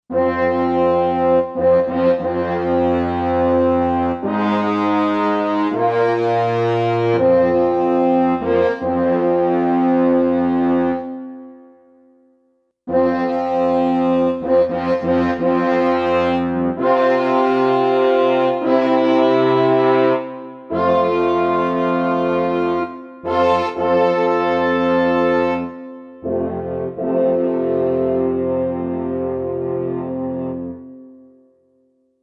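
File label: French Horn Section; Roland SC-880; Factory Patches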